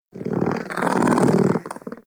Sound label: Kitten Growly Purr; Enemies; Warframe; Catbrow